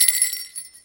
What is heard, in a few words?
Christmas Bell Collision; fowl; Christmas Bell Blocks